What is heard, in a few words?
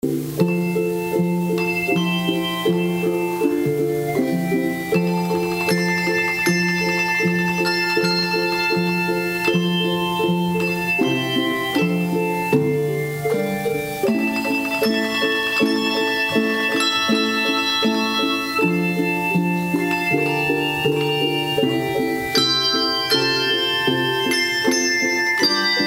Bell Choir